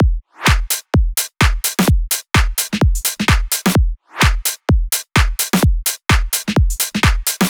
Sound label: House Full Drum Loop, 128 BPM; Drums; Loops; Cymatics; House Starter Pack